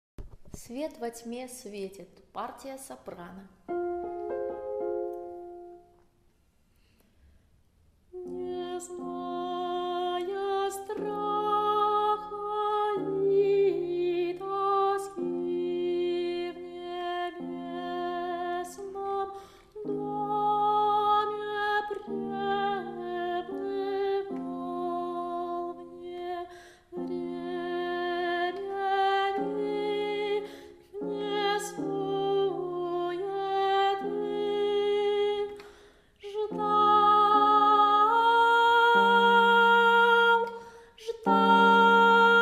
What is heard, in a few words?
soprano